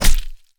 throw sabre

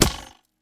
remote switchblade

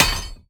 iron hit one

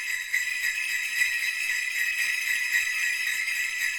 terror bell

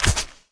p atk throwsabre

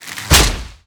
string tear

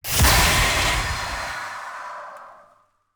steam release

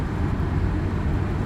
drive in car

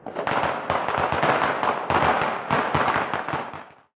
firecracker

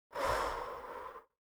female exhale

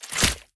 e imp vibe n

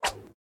sword

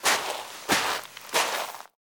fs sand

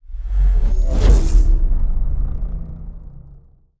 message wave

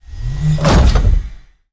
giant mecha

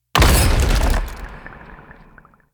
shield hit ground

